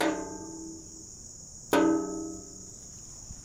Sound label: perc